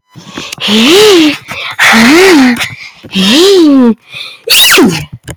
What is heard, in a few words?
yiii haa